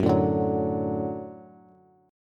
Gb Minor Sharp 5th Gb Bass; strummed; JGuitar

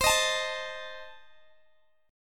C Minor 6th Guitar Open G, chord

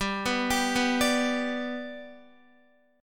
G Major, Guitar; G arpeggiated; JGuitar

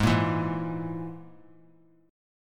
Ab Suspended 2nd Sharp 5th Ab down; strummed; JGuitar

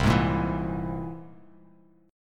C Augmented 7th; strummed; JGuitar

